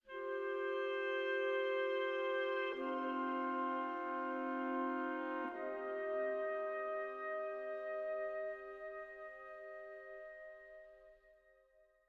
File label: Env Sky High Altitude Melody; the legend of zelda tears of the kingdom